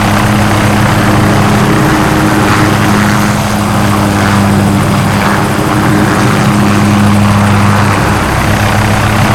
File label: littlebird move loop, vehicles; Call of Duty Modern Warfare